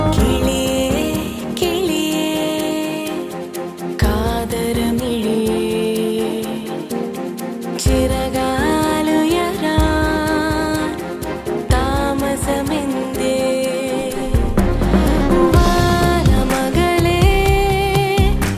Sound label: Malayalam Song Ringtone; Malayalam Movie Mayanadhi